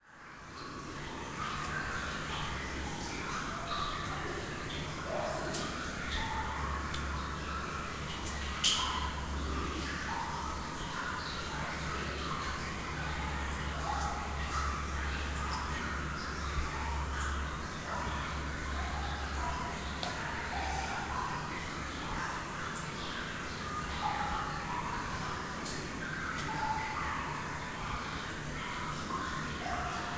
Water, Cave, Ambience, Water Drops, River

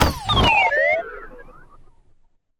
UI Mechanical Error; InspectorJ

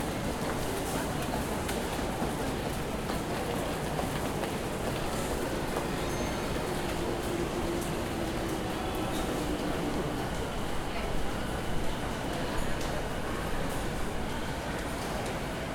SHINAGAWA Station, Japan Train and Platform; Prismo Sound

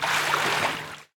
wade, footsteps; hl2

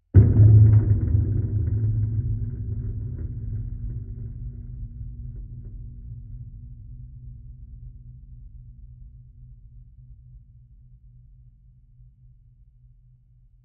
Drying Rack Metal Impact Hit Rumble Bell Leaf; Soundreorganized; Everything So Far

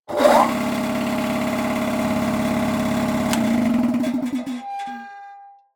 Air Compressor; SoundBits; Electro-mechanics Toolkit 2

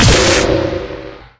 ball shoot, assassin; hl2

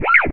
roller warning; hl2

